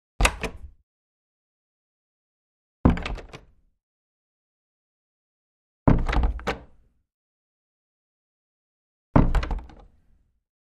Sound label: Door Wood Open, Close x4